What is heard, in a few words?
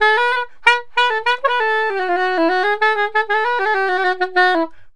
THA SAX; USB Soundscan vol.63; AKAI